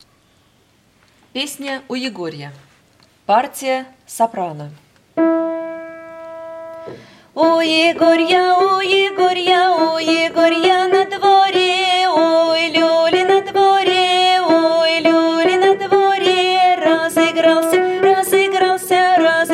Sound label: soprano; U egoriya